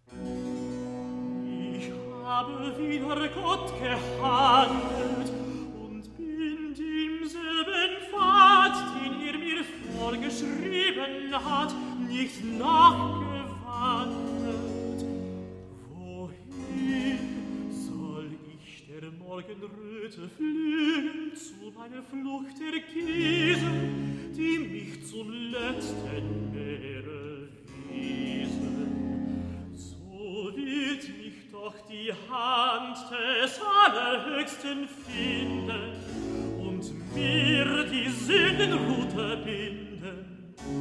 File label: Recitative; BWV55; Bach; Cantatas I